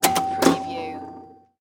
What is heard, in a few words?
Pinball Machine Flip